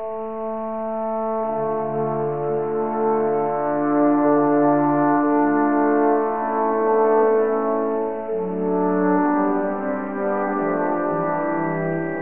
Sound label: sonata; gabrieli